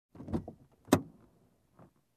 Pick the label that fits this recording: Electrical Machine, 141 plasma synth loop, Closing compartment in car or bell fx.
Closing compartment in car